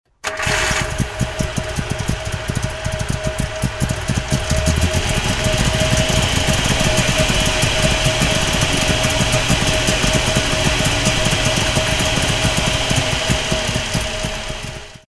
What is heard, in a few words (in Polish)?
syrena 105; dzwonki